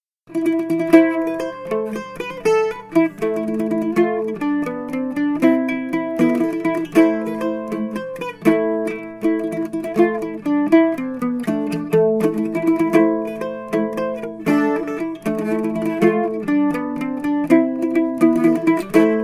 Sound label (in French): Fandango rapide; atelier danse